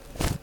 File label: Close bag